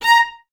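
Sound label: violas A#5 staccato, String Ensembles; Miroslav Vitous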